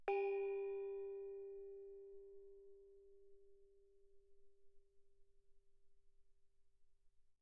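thai gong G4 mezzo forte, Percussion gongs & tamtams; thaigongs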